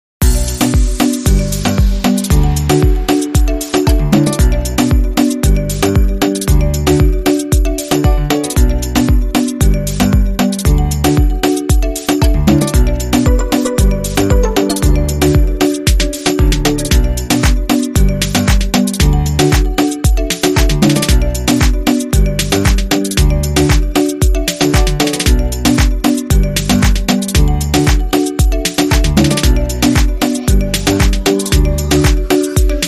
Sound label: Deep Low Short Rhythm Mix